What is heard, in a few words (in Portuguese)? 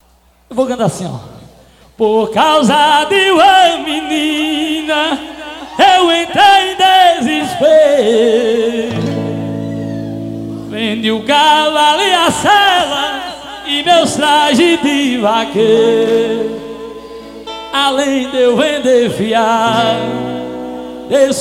vaqueiro ao vivo; petrolina